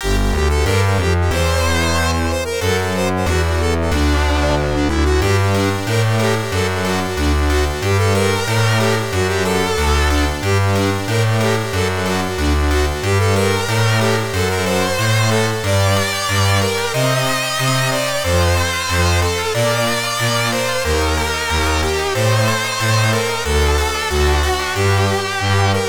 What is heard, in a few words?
Atmosphere; dragon knight 4; x68000